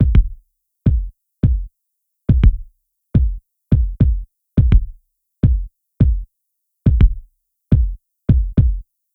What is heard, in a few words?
Kick Loop 105, Afrobeat; Afro Drums